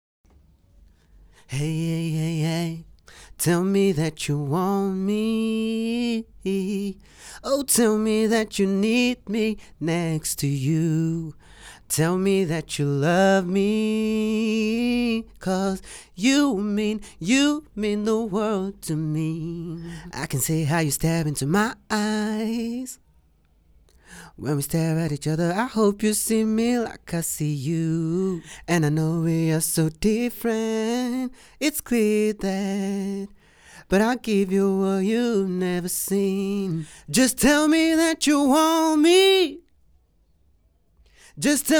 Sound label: Vocals Only